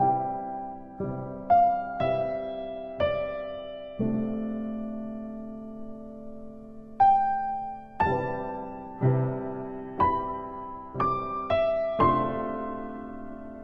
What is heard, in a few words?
piano tune; Minetest; VoxeLibre; mcl music